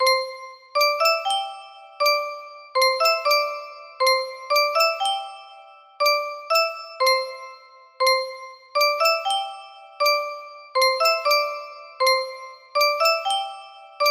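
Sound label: 30 note, music box melody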